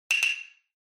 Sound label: Hyoshigi